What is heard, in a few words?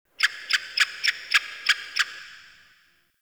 Critter Random; Warframe; Ambience; Nokko Colony